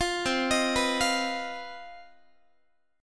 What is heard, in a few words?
C Minor Major 7th Double Flat 5th C down Guitar 4A 4E 5A 5D 5G sharp; CmM7bb5; arpeggiated